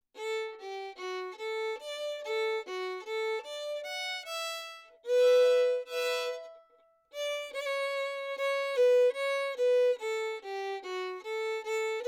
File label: Quebec Reel slow